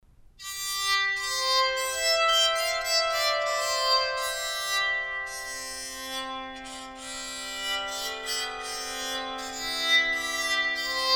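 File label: quilt walnut tenor sound, bowed psaltery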